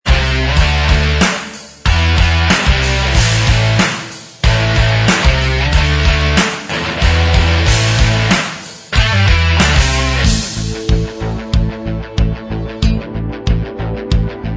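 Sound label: medley instrumental track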